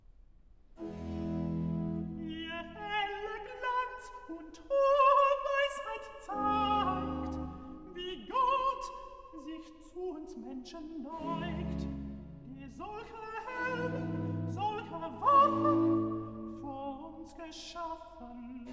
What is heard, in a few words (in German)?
Recitativo Alt; vocal; alto; BWV130; Ihr heller Glanz und hohe Weisheit Zeigt; BACH